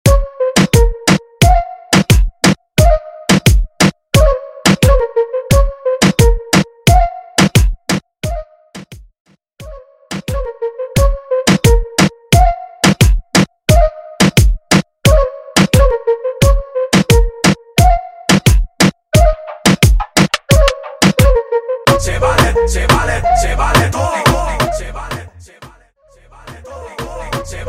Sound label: Intro Outro Dirty 88 BPM Mashup